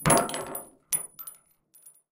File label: shell drop single